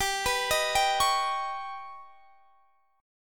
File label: Eb Augmented 7th